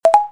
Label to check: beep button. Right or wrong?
Right